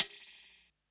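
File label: closed hi-hat; eddyflux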